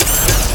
retch walk, strogg; Quake 4 Alpha

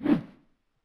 Imp Attack Whoosh, imp fireball; Doom

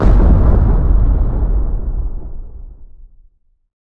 flak explo; Quake 4 Alpha

